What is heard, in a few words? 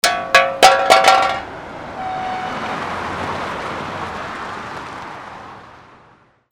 HIGHWAY